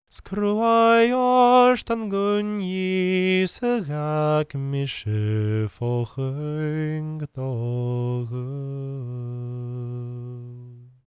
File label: GAELIC, World Voices; ILIO; Vocal Planet